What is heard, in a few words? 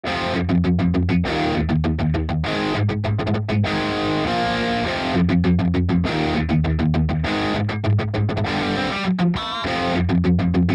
harmonic